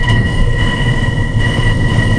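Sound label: computer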